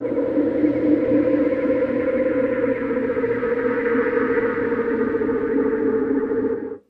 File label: Spidercraft Slw By, starship